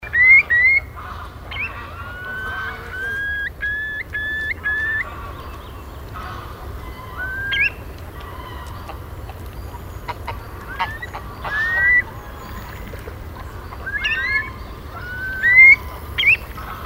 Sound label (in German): Dunkler Wasserlaeufer Grosser Brachvogel; Ammersee Binnensee LL; Susanne Rieck